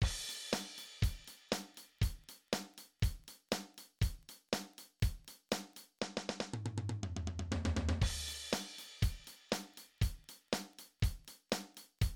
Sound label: Filler, drumset; Beginner Filler; Drumset Exercises